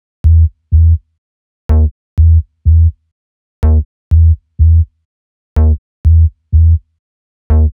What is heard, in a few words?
Bass Loop E 124BPM